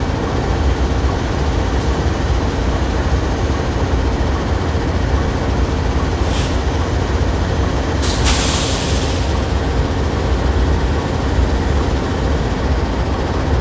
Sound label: train idle; ambient